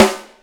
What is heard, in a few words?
CM SNARE; Drums & Perc; AKAI S6000 CD-ROM - Ultimate